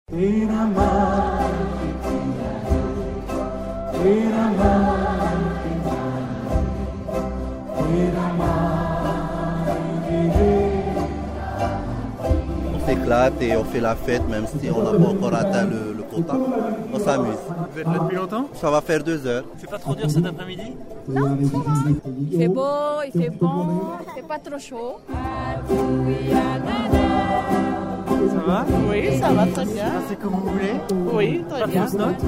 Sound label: Ukulele